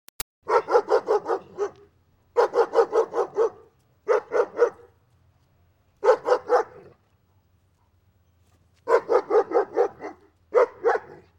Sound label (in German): Hofhund bellend; Haushalt